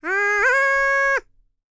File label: PERSIAN, World Voices; ILIO; Vocal Planet VOL-5